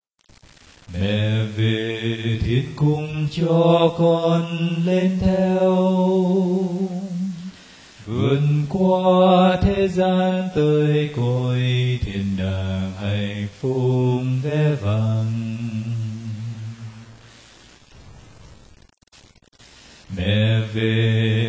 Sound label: BASS